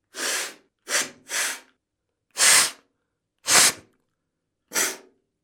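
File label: nose blowing sound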